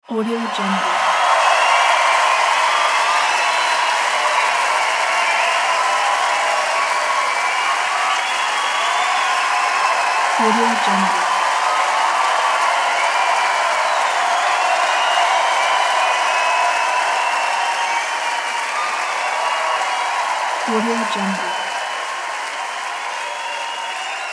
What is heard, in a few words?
crowd cheering